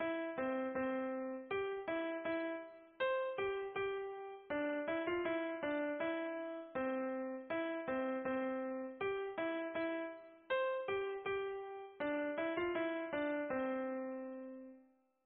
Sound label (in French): version instrumentale; le petit ver de terre